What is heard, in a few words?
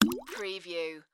Splash Heavy Single